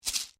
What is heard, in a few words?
RATTLE GOURDE SHORT, traditional pasific island gourde rattle; Rattles; Musical